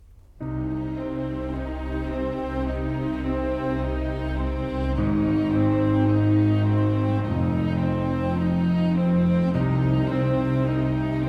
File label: Calamity Strings Only